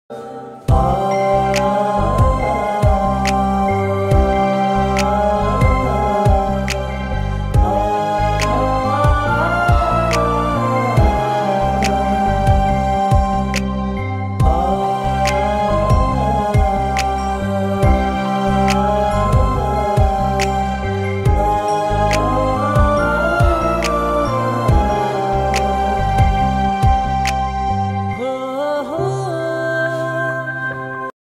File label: ost ringtone love ringtone